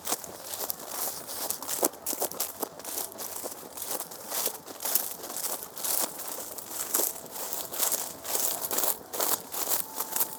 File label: running away through corn fiel